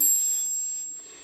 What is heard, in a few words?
triangle